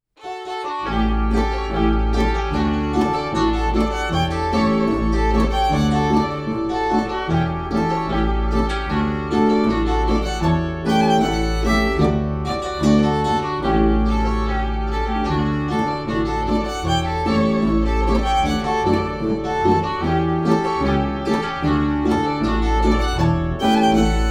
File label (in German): Boarischer